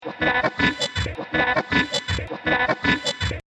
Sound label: Laser Experiment, science fiction sound effect; Laser Sound Sound Effects; Andrew Fleming